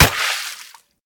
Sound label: hit; kappa; Smite; god Kuzenbo v2